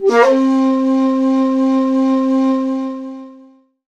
Dance Synths; Best Service ProSamples vol.37; Ethnic Synths

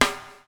BUBL; Spectrasonics - Backbeat

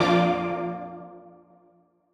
Old Skool A#2

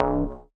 Hollow Moog Bass; Big Fish Audio; Old Skool House; OSH Bass

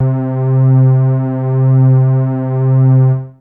303-Style single hits

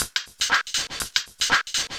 AO 120, Dance Grooves; Best Service; Dance Mega Add On Grooves

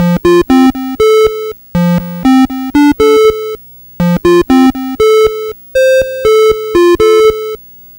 Atari 2600 Synth FX; 8-Bit; Chiptune

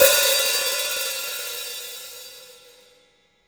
HH OP, Drum Dry; East Collexion; Drum 1

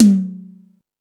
More Toms, E-MU Pro-Cussion

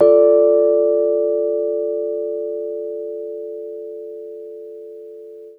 Chords Hits; E-MU Producer Series Vol. 8 – Platinum Phatt; EP Chords Hits

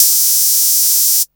SYNTH HAT; Best Service Alpha Dance vol.2

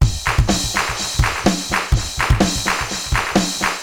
80s delay Akai XE8 (125BPM), cyborg beats

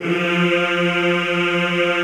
F2, Classical Choir; Best Service; Extended Classical Choir

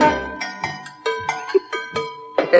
Dark C Bell Loop; Movie Bits

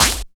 SNR, Tekno Industrial; East West

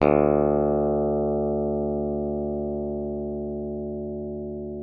PLECTRON FX D2; Xsample VOL-1; 90 s Sample CDs

